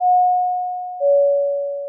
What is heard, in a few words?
ding dong; SnootGame